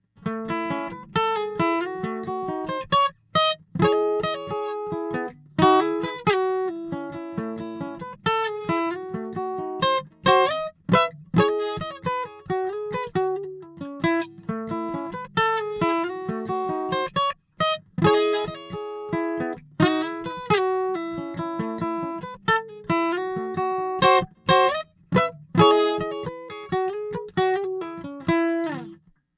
Collings D2H DPA 4011

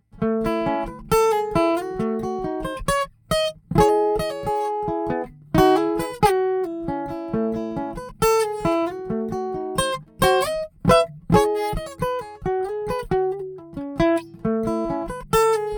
HD28 Neumann U47

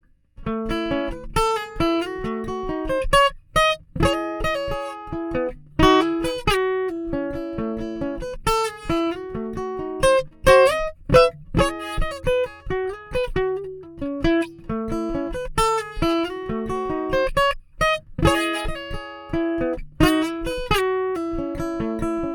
HD28 Soundelux E47

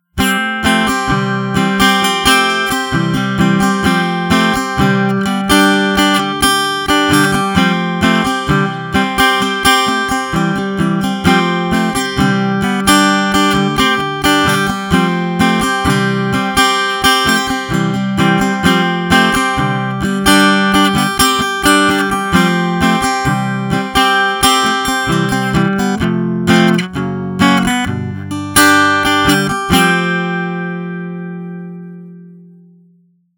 Hummingbird Shure Beta 58A, guitars

